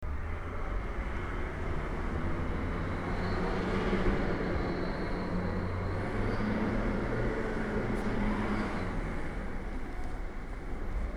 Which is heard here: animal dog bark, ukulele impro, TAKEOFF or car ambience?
car ambience